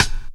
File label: Rim Shot, Programmed Stepz, drum loop hits; Zero-G Jungle Warfare 1, 2 & 3; Percussion Hits